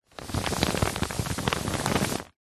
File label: paper sheet on soft surface hand rub over crumple rustle